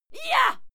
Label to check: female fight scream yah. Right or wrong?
Right